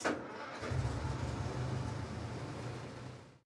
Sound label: engines; World of Tanks